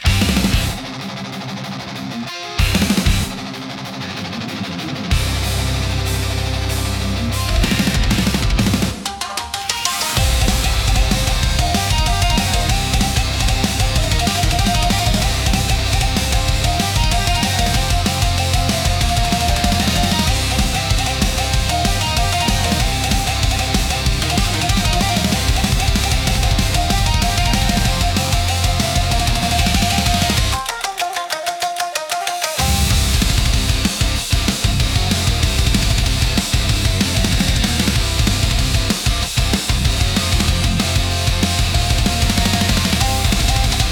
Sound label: intense japanese rock